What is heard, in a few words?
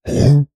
surprise, Orc Creature; Sounds Great; Orc Creature Library